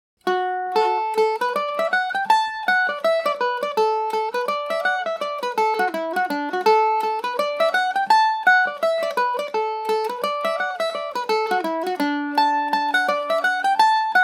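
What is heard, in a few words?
A mixolydian, Irish mandolin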